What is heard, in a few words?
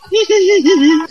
hihi; unloze